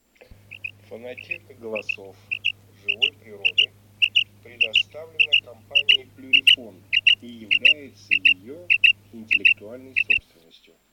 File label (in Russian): Перепел Quaglia